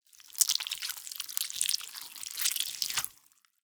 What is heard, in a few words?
gore slime; Lewd SFX